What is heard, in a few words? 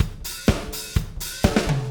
125 Disco Drums; Pop Rock